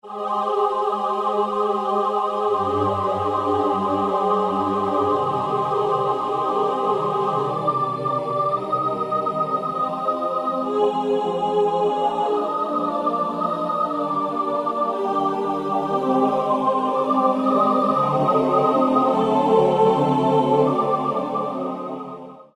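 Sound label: Double Choir, Roland U-110; Factory Patch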